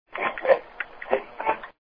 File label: radio sweep